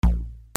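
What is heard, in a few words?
percussion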